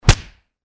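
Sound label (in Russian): звуки драки людей и удара кулаком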